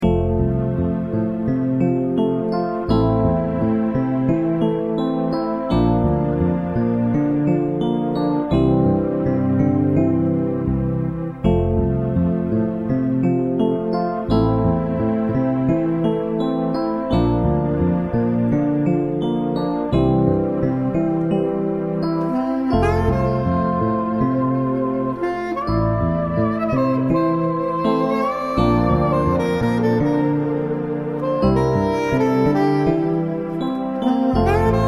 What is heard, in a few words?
string quartet; Gabriella Strings